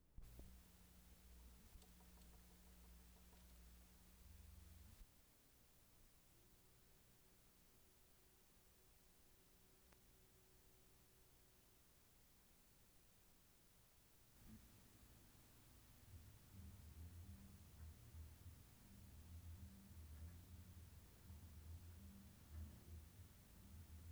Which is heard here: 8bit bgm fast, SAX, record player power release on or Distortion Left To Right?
SAX